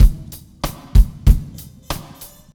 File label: muted trumpet, Boombap Drum loops